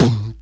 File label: Beatbox Kick, Human Beatbox